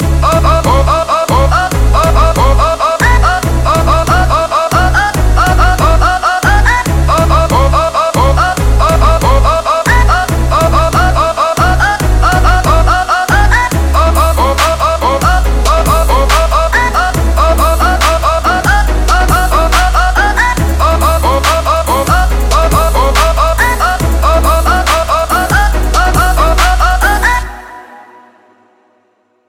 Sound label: Kit Full Loop